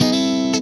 FUNK N CHUNK, FUNK GUITAR; Greytsounds

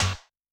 Rave Wave, Yamaha DTXpress-II snare

